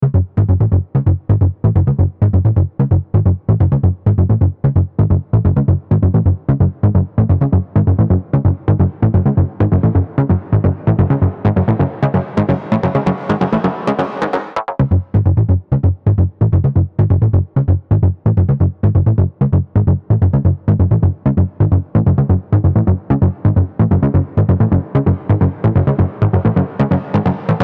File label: JX3P Above The Clouds Bass wet